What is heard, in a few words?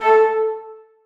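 flutes staccato a3; Sonatina Symphonic Orchestra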